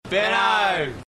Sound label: Aussie Shouting